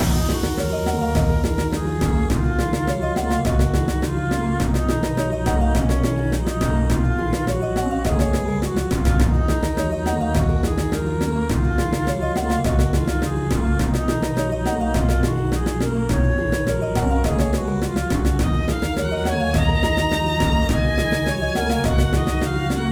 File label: Sad boss battle